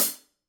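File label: signature precision 14 hi-hat closed, cymbales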